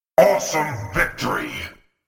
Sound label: awesome victory